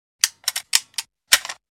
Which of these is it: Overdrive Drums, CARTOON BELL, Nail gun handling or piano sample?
Nail gun handling